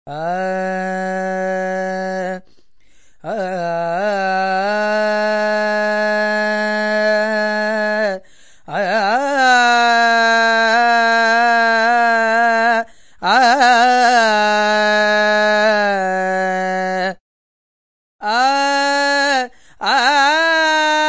deep india, Indian Singing; VOCALS and SPOKEN WORD

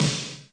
Kawai R50 SD REV; drum machines

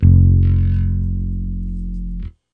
acoustic bass f sharp; Basses

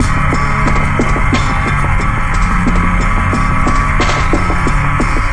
stellar atmosphere; SYNTH AND ELECTRONIC LOOPS

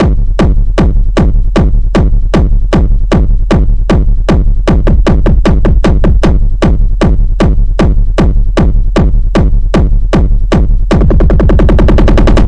DRUM LOOPS and BREAKS, 154 bpm; 141 to 160 bpm

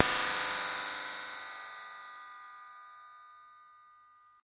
FM Cymbal, harsh; Harsh FM Cymbals

